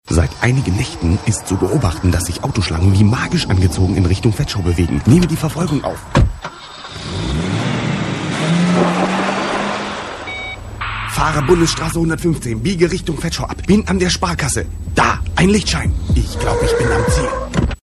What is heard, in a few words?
Disco; Vetschau